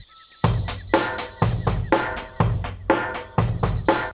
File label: whip small